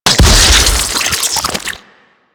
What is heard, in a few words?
Cyst Explosion Single; Warframe; Infested Eris; Destructable Cysts